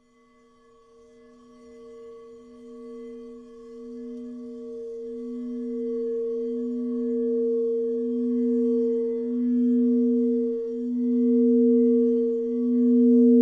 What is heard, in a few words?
crybowl